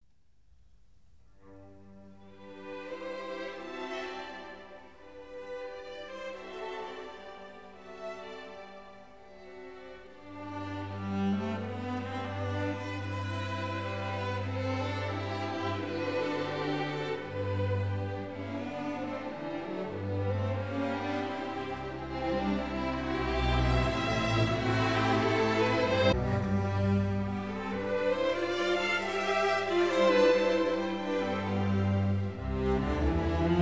Serenade for Strings in E Minor

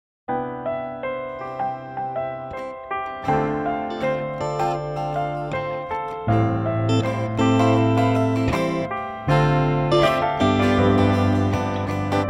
Breakbeat; Kirbys Dream Land 2; Forest; OC ReMix

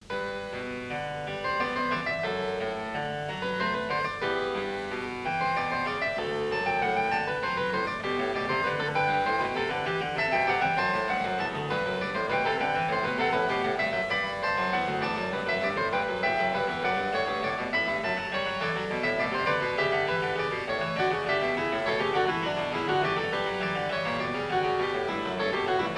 Canone all'unisuono Poco andante; Goldberg Variations BWV 988 Variation 3

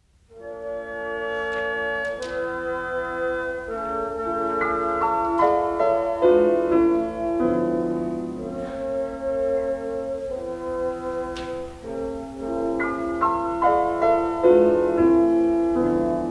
Concerto For Piano Orchestra Vivace; Howard Blake

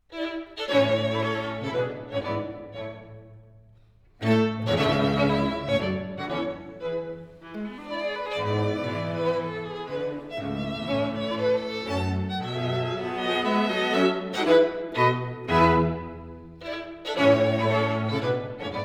String Quartet No 3 in A Minor Vivace giocoso

